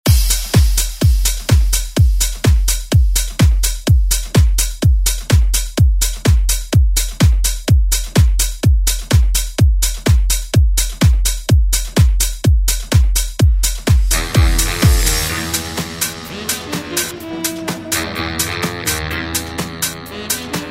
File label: 90s Re Drum